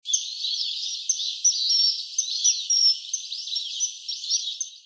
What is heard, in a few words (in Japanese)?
kawasemi